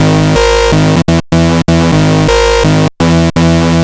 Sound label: Super Saw Bass; Sonic Foundry (Sony Creative Software); Underground UK House & Electro